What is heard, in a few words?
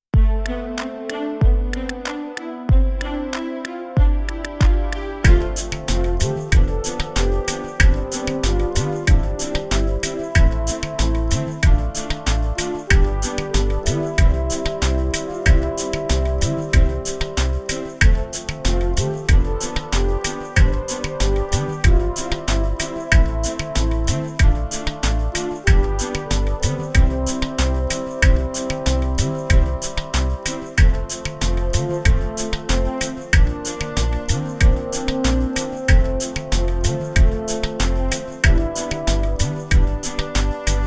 Fun orchestral